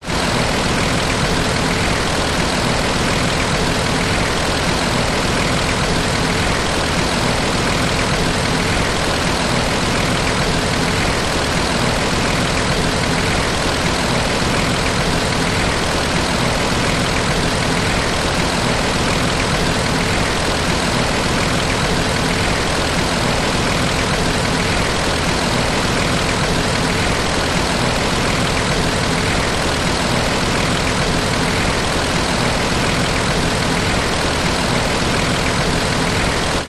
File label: car suv nissan patrol diesel idle front ext; vehicles